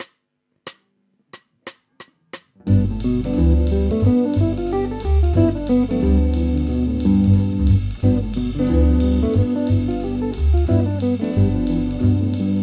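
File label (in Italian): Esercizio G7 in posizione di E in drop 3, chitarra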